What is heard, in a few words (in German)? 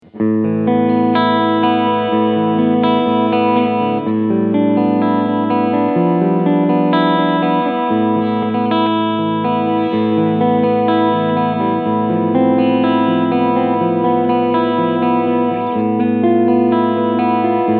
SC Custom II FR Vintage Cleansound beide PUs; Harley Benton